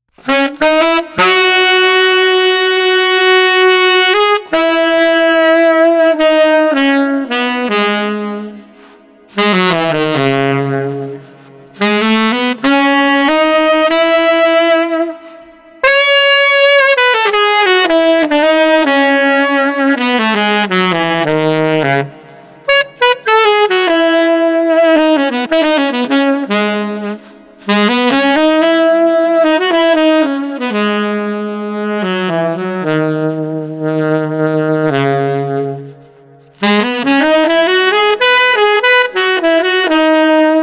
Street Sax Chilled 100

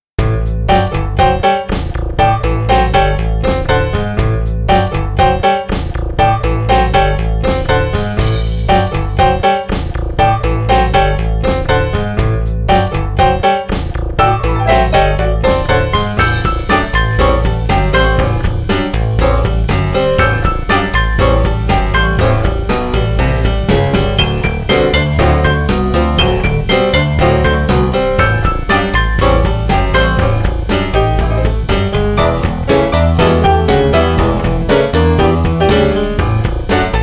Funky

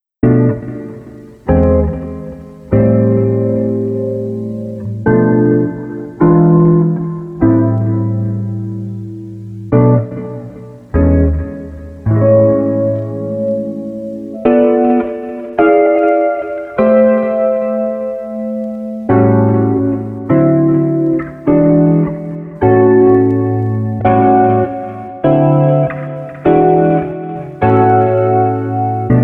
Street Guitar Jazz Mellow 103

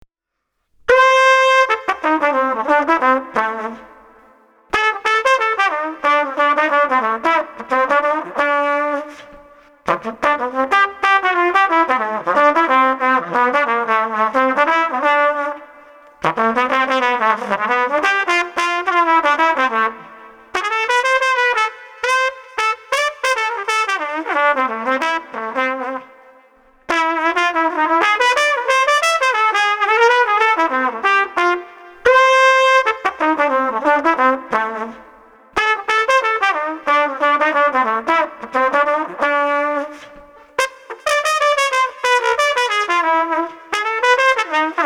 Street Trumpet Positive Mood 90

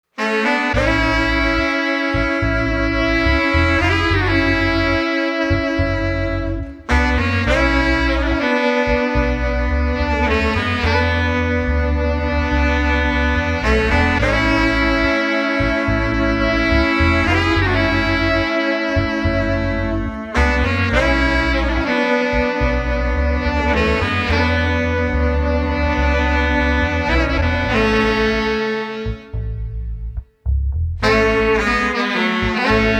Street Brass Ensemble Laidback 107

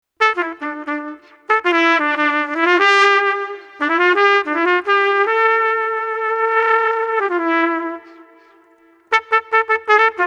Trumpet Expressive 105

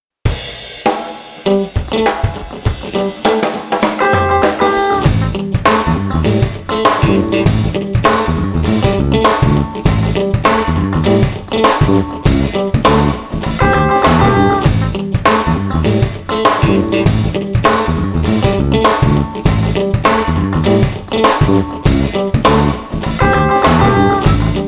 Blues Rock Funky Groover Underscore 100

